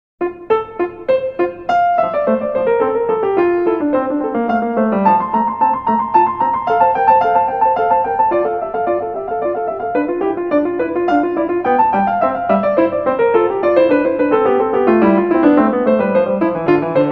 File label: Invention In F Major; Marlais Book 6